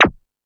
Hip House, drum machine

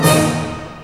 HIT, OTHER SYNTHS; Rubber Chicken Software Sample Bank

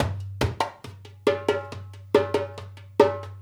Dumbek; Essential Percussion

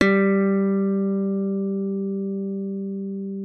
thumb G G3, slap thumb; Xsample VOL-1; 90 s Sample CDs

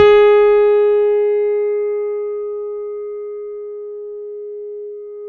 G#4, Piano, cp80

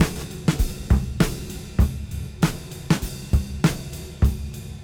Cym Beat 99 BPM Rev

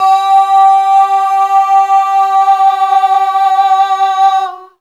TWIN OH; AMG

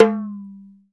PER Percussion

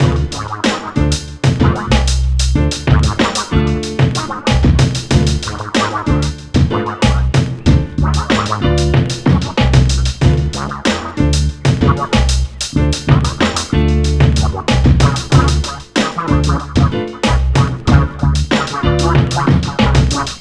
Piano Stomp, Urban Grooves; Sonic Foundry (Sony Creative Software); Stylus Pressure Urban Grooves on Digital Wax

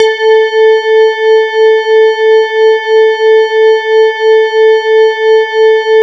E-HARP, DX HARP; Wizoo - Powered DX

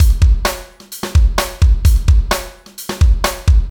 DUDE JAM 129, LOOPS

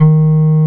OB8 BLOW EB2, OBERHEIM OB8; Big Fish Audio; First Call Vintage Keyboards

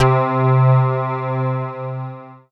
Dance Floor Bass, Multisample Basses

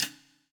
POWER RIM

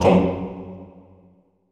CHO, Roland

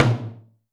tom, Zoom MRT-3B

